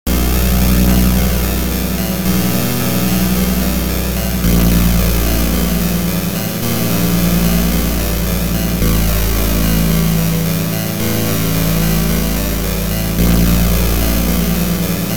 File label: Level Complete; Legendary Axe 2